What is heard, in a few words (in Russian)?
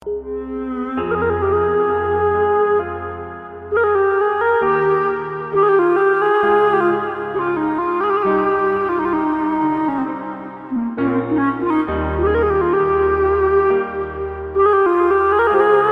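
грустная иранская музыка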